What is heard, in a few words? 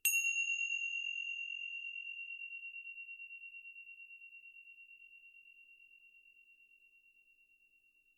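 tibetan cymbal close sound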